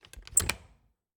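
Refrigerator, old fashioned, wooden, steel, brass clasps, hinges, open, soft; Eiravaein Works; Vaeyan IV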